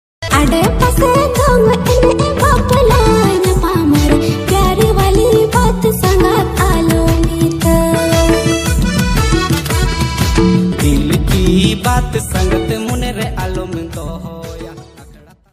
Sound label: santhali ringtone song